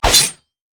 pirate basic attack; LoL; gangplank

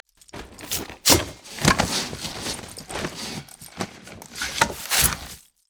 emote dance; nidalee

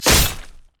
basic attack sword; rengar

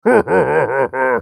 Yeti laugh

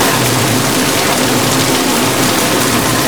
thermal charge; noxian; LoL; urgot

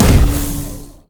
overdrive; LoL; blitzcrank iblitz

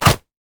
smokebomb; LoL; akali

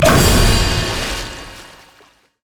nami hydroblast; LoL; koi

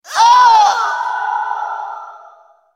dying; BlackIceAnivia

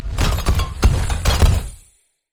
rock; lissandra skin01

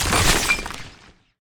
shatter; lissandra skin01

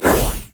ranged attack; LoL; jayce

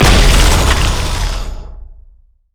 ring of frost; LoL; lissandra

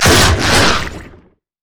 stretch strike; LoL; zac base

